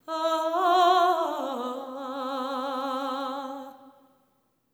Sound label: LAMENT